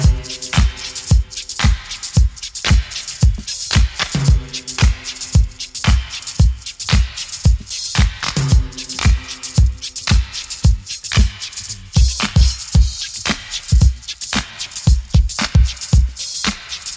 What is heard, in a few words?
113 bpm modern drum loop sample c key